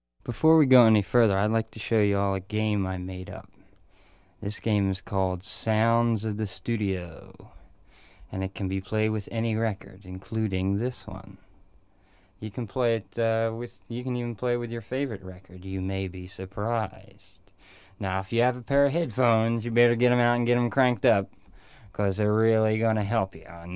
sounds of the studio

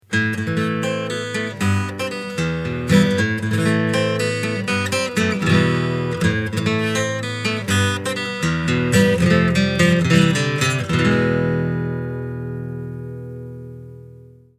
Distortion Guitar Dirty

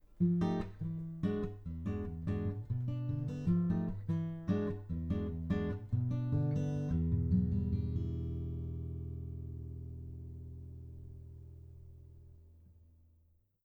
Apex 205 Mod Acoustic Soft, ribbon mic; Modded mic – Finger picked guitar

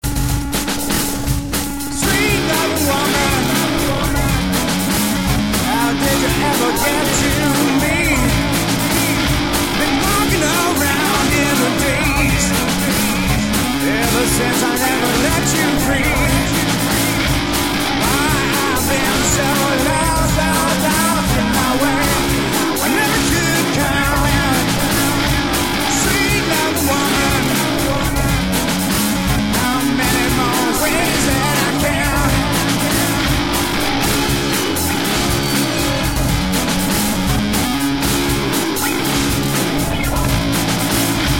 Rough Vocal Melody Idea

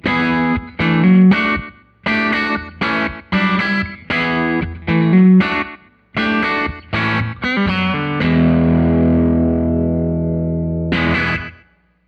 Apex 205 Stock ElecGuitar; Stock mic – Electric guitar; ribbon mic; Loud guitar

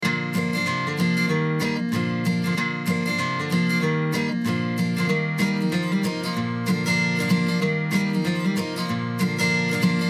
eq narrow boost; eq cut narrow boost wide

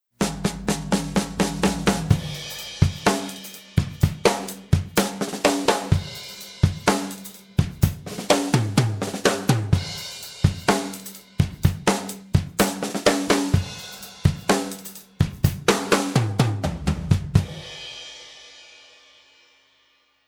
Comp Kick Snare; compressors

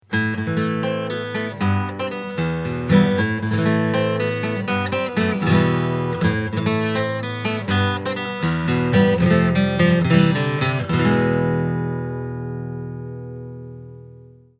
Distortion Guitar Clean

